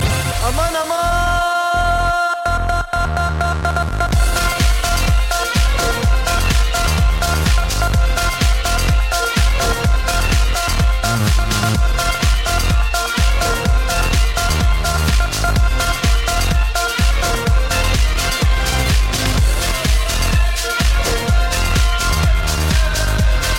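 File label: Ethno Mix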